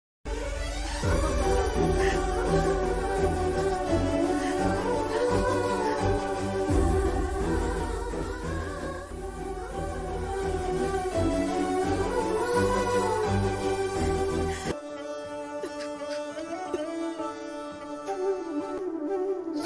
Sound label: happiness bgm; yashoda; samantha ruth prabhu; yashoda movie